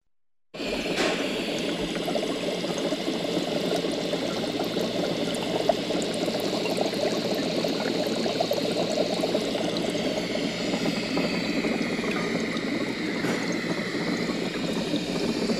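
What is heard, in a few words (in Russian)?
лаборатория голоса шум горелки кипящая вода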